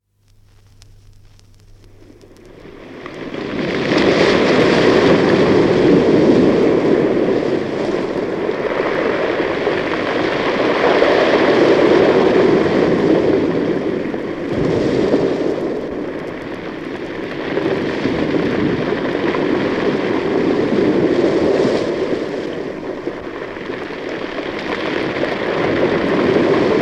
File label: Seawash on rocks and shingle in cove; From 78 disc; Ocean; BBC